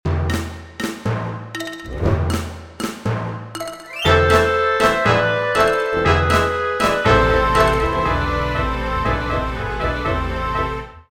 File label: Orchestral